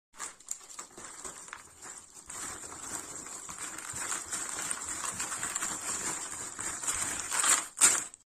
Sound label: Puzzle